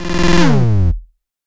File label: alert, notifications; Android